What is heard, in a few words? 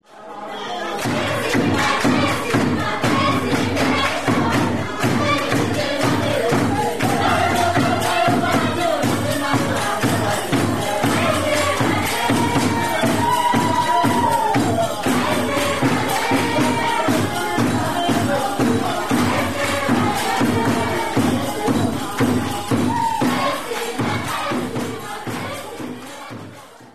intro happy